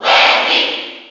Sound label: Cheer; Wendy Koopa; SSB4